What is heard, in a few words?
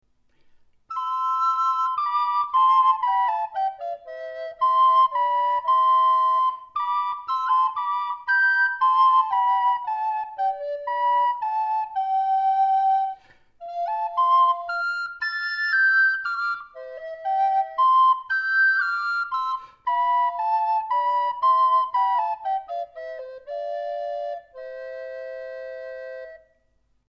Duet, recorders